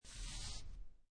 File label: Poker Chips Stack Of Chips Slide On Felt; Gambling; Lynne Music